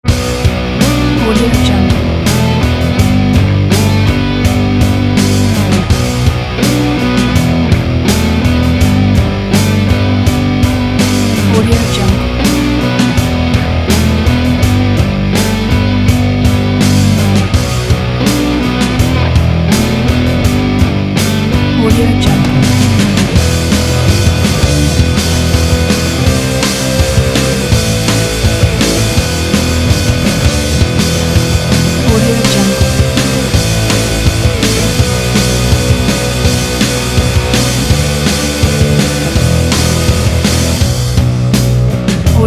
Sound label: alternative hard rock